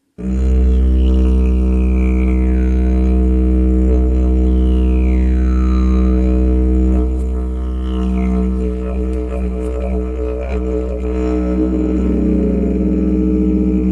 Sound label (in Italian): didgeridoo do grosso